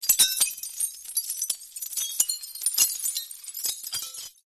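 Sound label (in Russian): падения обломков стекла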